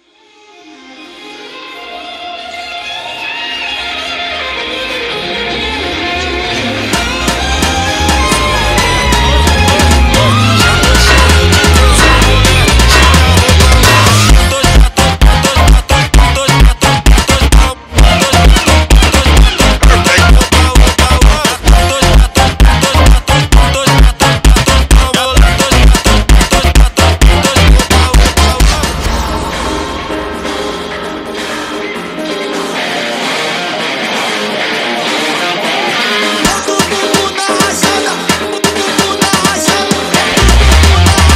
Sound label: funk